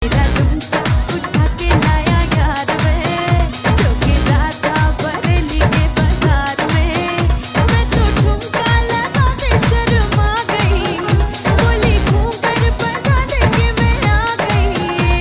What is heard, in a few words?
Hindi Remix Tones; Hindi Movie